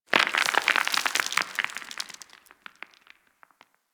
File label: Outpost Rock Debris; Warframe; Ambience; Corpus; Outpost Defense Two